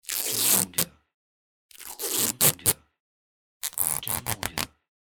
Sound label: Scotch Tape